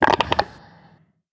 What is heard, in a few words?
chat; gonarch